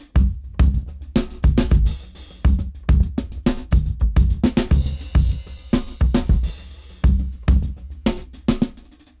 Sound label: Jazz Dry 104 BPM; AMG - Keith LeBlanc Phat Butt Jams